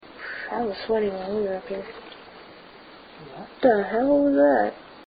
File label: attic moan; elsings 2nd hand shop